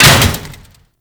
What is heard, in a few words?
Mace Impact Metal